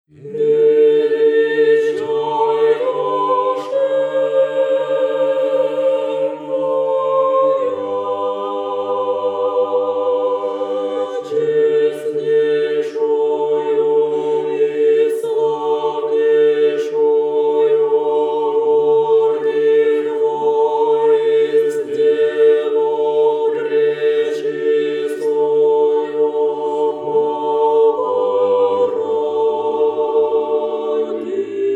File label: Soprano Kreschenie Gospodne Pripev na 9 y pesni; religiya